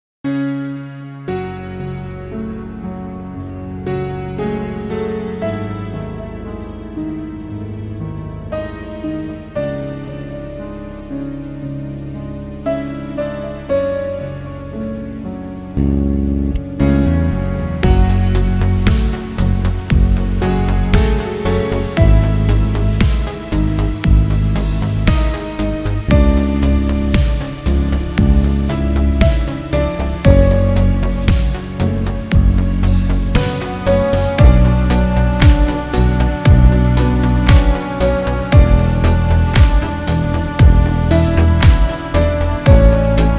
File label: prelude in f sharp minor